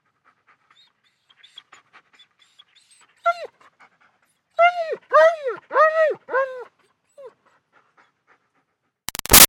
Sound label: Dog German Shepherd Whines Barks; Dogs; Animals; Big Room Sound